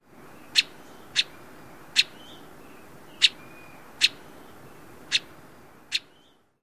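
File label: call, bird; AZ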